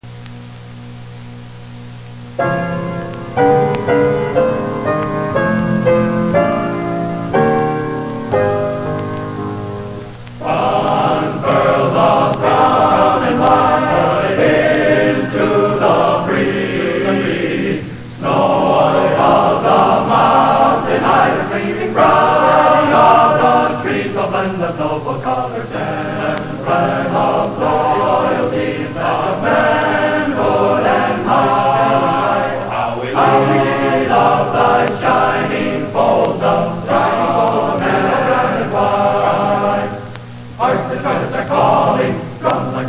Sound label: chorus; football